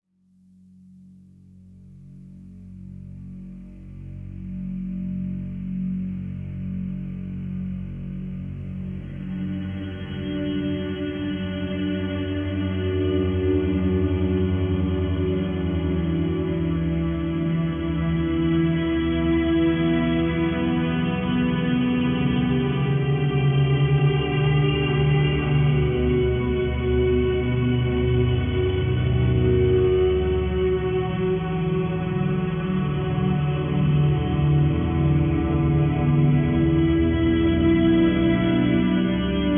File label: Menu Music; Netherworld: Before Time I Stand